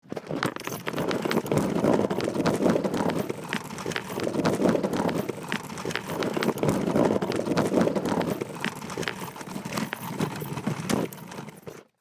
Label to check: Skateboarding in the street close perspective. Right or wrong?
Right